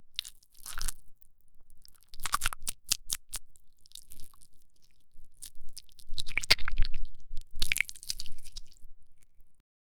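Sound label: squishing slime with fingers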